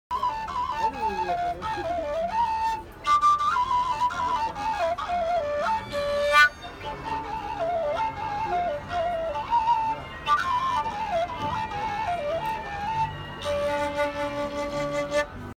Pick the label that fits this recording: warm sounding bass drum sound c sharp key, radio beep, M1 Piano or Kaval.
Kaval